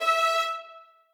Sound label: string